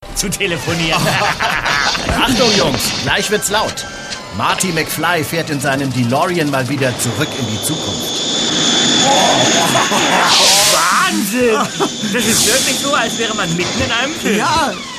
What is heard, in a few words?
turbo boost